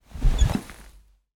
household person sit down on dining chair wooden cushioned recorded underneath